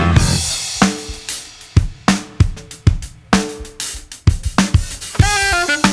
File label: sampled breaks